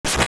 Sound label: Fire Out; Putting out a fire in the Cave of Flames